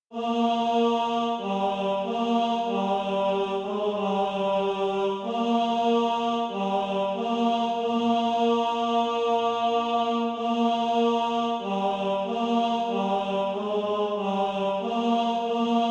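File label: tenor, SATB Practise Music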